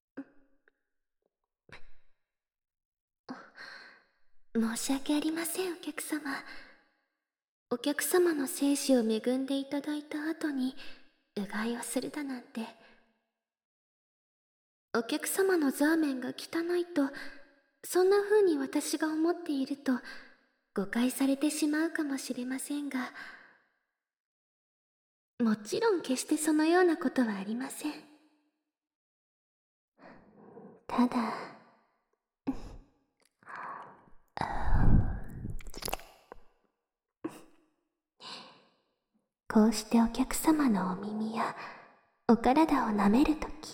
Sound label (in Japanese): ちんぽマッサージ～姉妹ローション, ASMR